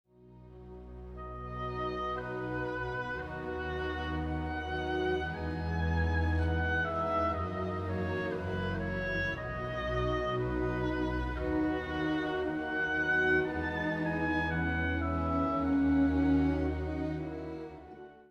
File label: Waltz